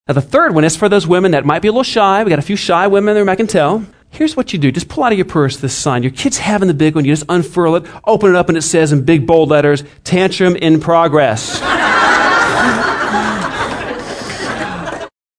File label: tantrum in progress